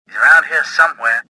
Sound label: escaping; worker1